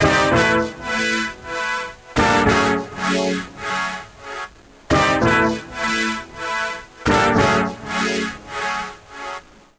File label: Old School Stab 83bpm; MIDI Clips; Melodic; Ableton; Factory Packs; Chop and Swing